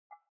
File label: elements water dabble single glas bowl soft; smartsoundfx